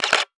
nikon camera shutter

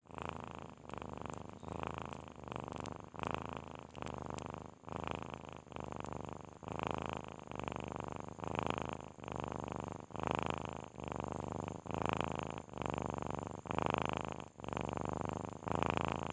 kitten snore

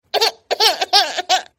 crying baby sound

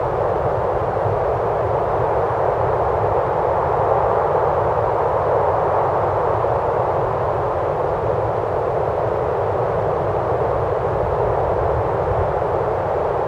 winter wind loop

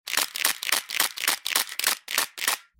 camera shutter

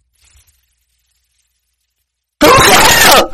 creepy owl sound

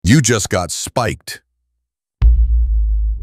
spiked sound

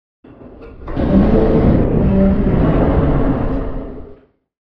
monster

